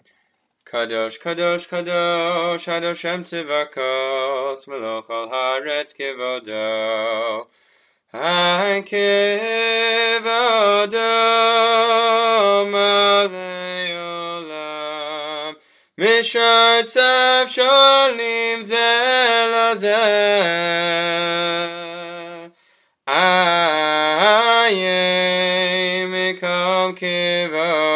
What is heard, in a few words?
Kadosh Slow Melody; Musaf